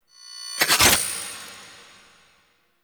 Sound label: Catch Glaive; Warframe; The War Within; Mountain Pass; Teshin; Cinematics